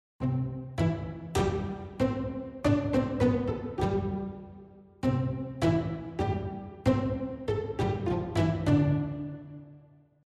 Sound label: Ensemble Strings Full Mid Pizzicato Tight und Loose; Chris Hein